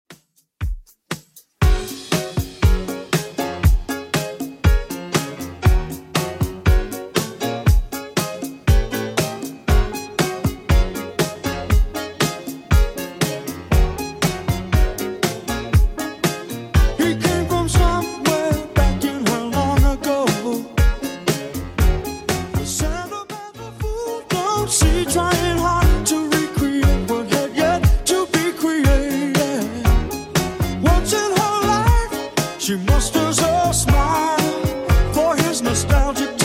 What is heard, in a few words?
70s Redrum 119 bpm